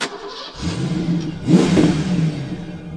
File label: startup, vehicles; Dystopia; brute